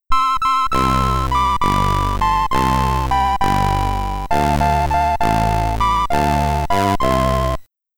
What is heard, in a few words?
Netherlands